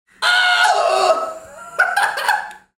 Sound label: laugh; "Oh...hahaha!"